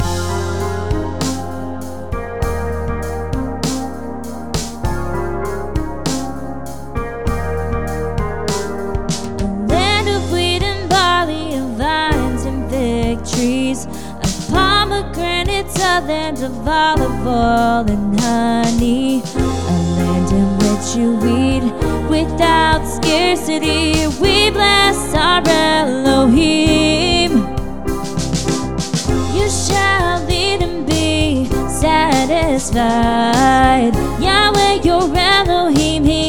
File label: Song Vocals; sukkot